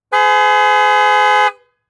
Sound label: Jeep Commander Horn Long; transportation